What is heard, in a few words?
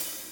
shaker sound sample c sharp key; Duration 0:01; percussion